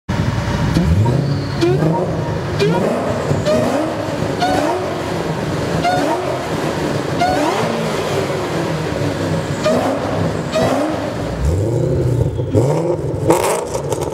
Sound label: Ford Mustang Shelby Stock, car engine